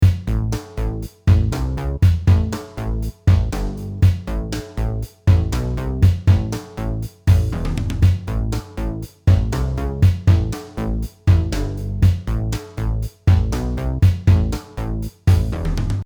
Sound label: Brushed groove